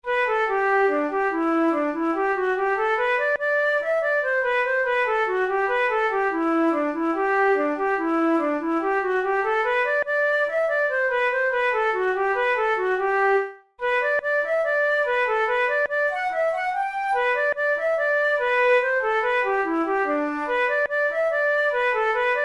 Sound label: reel, flute; kitty oneill